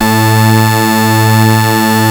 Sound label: OB A3 -R, Rare Synthesizer; Hollywood Edge - Giorgio Moroder Rare Synthesizer Collection; OB 4 VOX.